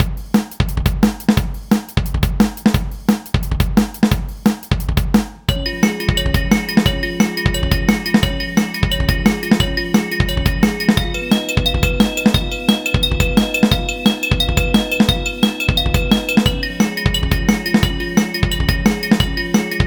Glocken (Instrumental); Friday Night Funkin' Vs. Rinne

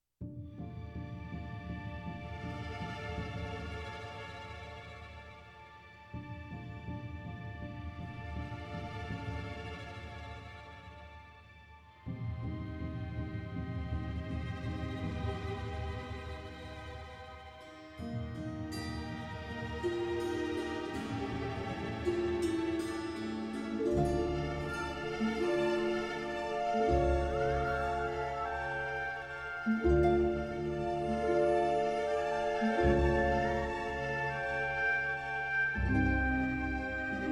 Meadow Loop; The Witcher 3 Wild Hunt; Toussaint

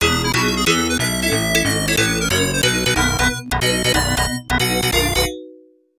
[Normal] Intro, arcade; Rainbow Islands

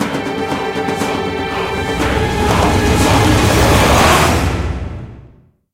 Volcano Erupt; Quest Stinger AA2; club penguin island; android

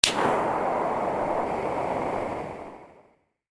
SFX torch fire; through abandoned the underground city

